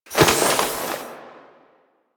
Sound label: Cover Cast; Warframe; Quests; The New War; Kahl; Abilities